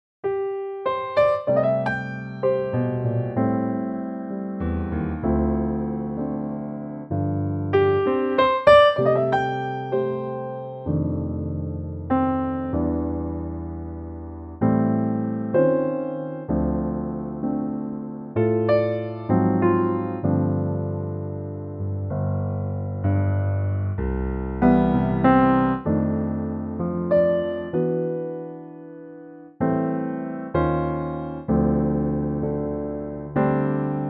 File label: C piano